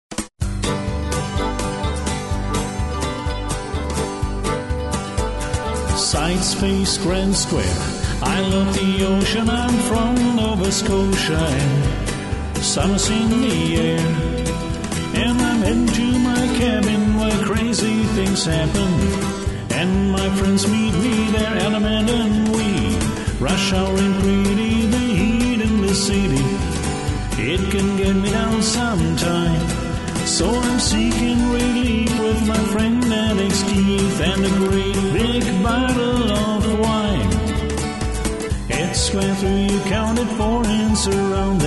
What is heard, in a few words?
vocal, square dance